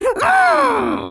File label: Pack Rat Death